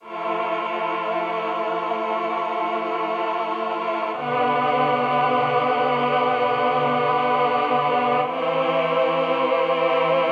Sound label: Looking Back Groove